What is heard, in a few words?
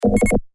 neo arcade movement